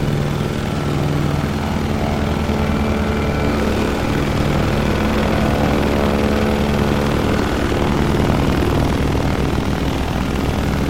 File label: plane